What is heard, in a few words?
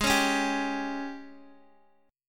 Ab Major 7th Suspended 4th Ab Guitar Open D; down